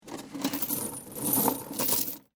Chain Metal Rattling And Drop; Part of the LYN103 package of Chain Sounds; Metal Sound Effects

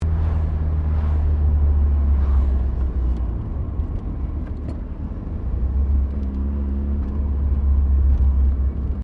CAR MAZDA RX7 SERIES3 INTERIOR DECELERATING; Car Mazda Rx7 1983 Series3; Cars; Vehicles

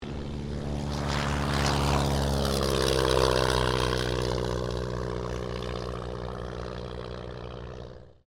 AIRCRAFT RYAN STM-S2 TAKEOFF; 1939; Airplanes; Vehicles

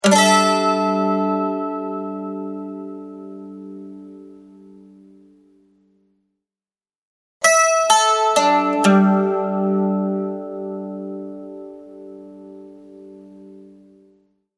Mandolin, Plucked and strummed mandolin sounds.; Strings; Musical

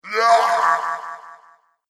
Orc Echo Yell; horror; Monster Sound Effects

